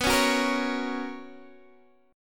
B Major 7th Suspended 2nd B down Guitar DADGAD

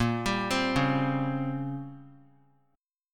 A sharp Minor 6th Add 9th A sharp down, chord; arpeggiated

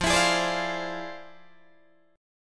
F Minor Major 13th, Guitar, DADGAD

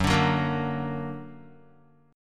Gb Augmented Guitar; 2,5,4,3,2,x; Gb+ strummed

